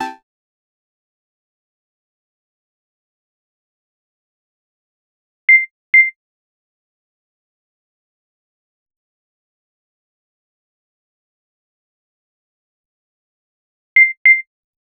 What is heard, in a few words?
a variety of satisfying, notifications